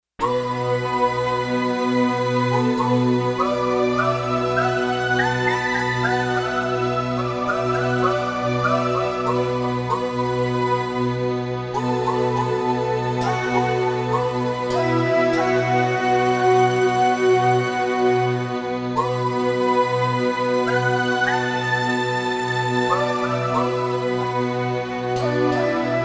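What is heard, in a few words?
Japanese Flute Orch; Digital Synthesizer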